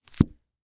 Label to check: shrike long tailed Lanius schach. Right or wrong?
Wrong